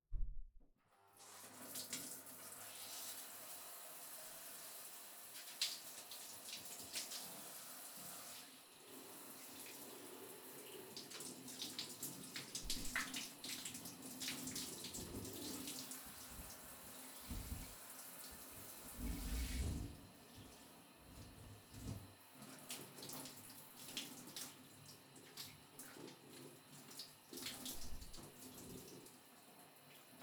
シャワーで髪を流す; KU100; お風呂に関する音

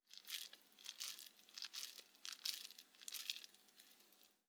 おにぎりを握る音

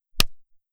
ぱちん

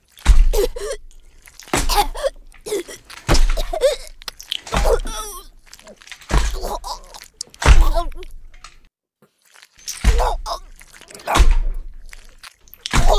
喉奥をこずく; 効果音あり

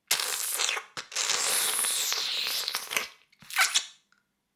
耳舐め音声(LR逆ver); 少尉; 牧場戦腺

